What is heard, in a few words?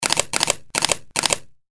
silenced MP5 3 shot burst